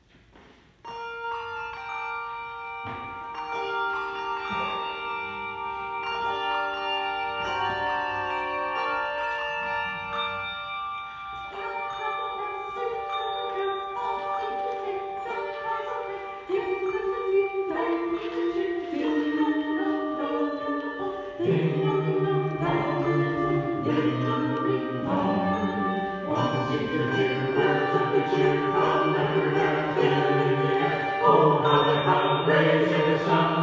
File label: Carol of the Bells with CHOIR audio